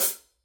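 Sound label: signature precision 14 sound edge hi-hat closed, cymbales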